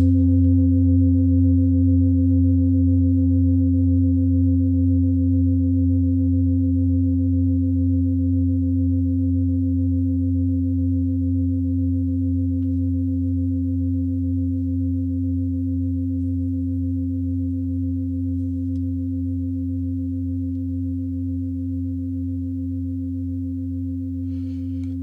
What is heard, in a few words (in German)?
klangschale set; Durchmesser = 30,9cm; Höhe = 13,5cm